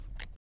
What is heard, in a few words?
item rollover